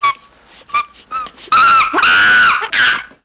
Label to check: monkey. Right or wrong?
Right